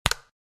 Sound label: Modern Ui